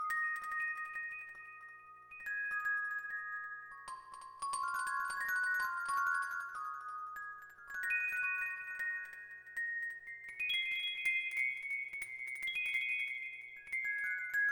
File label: Spirit Chimes; Proteus